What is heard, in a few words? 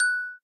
bell, note; Indev+ 0.3 Portable